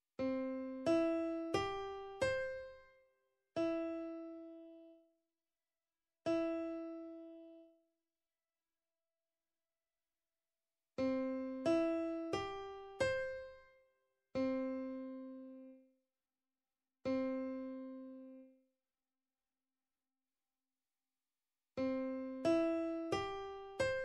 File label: Recognise Argeggio Note Key C Key G; Grade 1